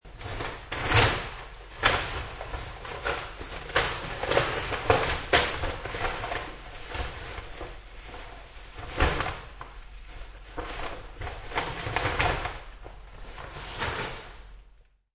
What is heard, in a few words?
Trshbag Noiz, Noises of fumbling with a trash bag.; Cleaning; Household